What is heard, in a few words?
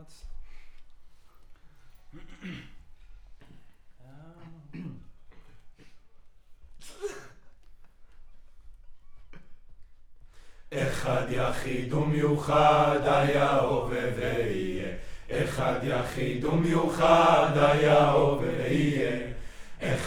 Choir